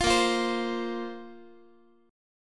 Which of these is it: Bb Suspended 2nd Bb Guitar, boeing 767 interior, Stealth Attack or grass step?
Bb Suspended 2nd Bb Guitar